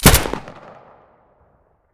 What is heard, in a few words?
abakan muzzle stereo; STALKER; IWP